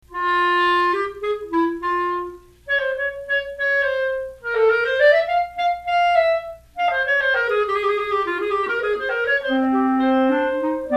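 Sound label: Prelude & Fugue, clarinet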